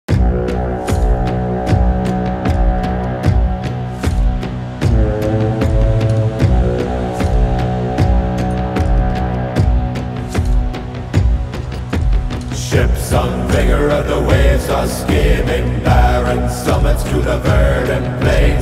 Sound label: viking nordic